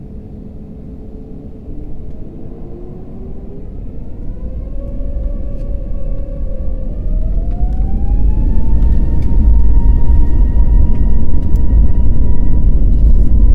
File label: taking off